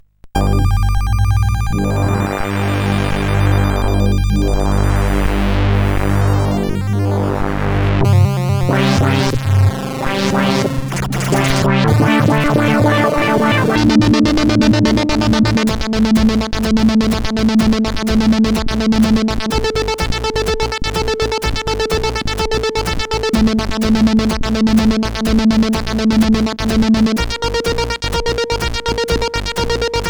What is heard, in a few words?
Morse Code, CSG8580R5